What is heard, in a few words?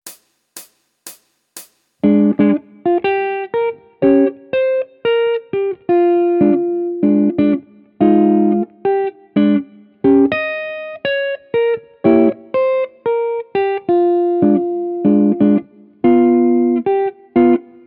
Jazz Chord Melody, Guitar